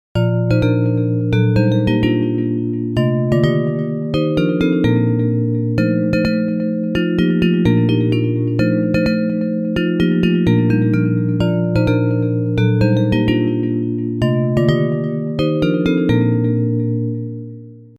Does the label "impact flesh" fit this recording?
No